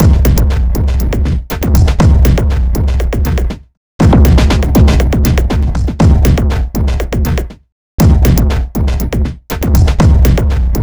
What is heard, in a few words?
Processed Beat, Drums & Percussion; Electrocution; Processed Beats